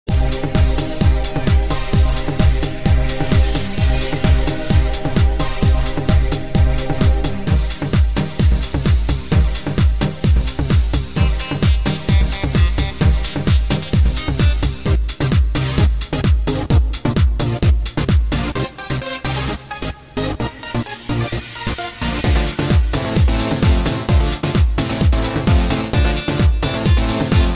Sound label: synth madness